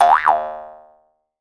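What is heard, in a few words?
Jaw Harps, Pitched Percussion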